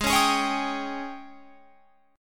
G sharp Major 7th Suspended 4th G sharp, Guitar Open F; G#M7sus4 Chord